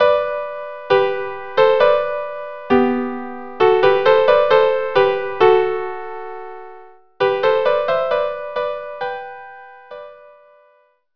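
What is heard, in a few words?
violin violin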